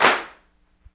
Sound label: CLAPS, Africa Handclaps